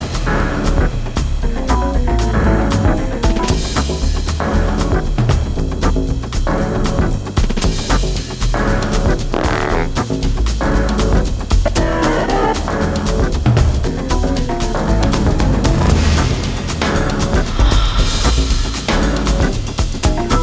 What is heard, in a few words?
hammond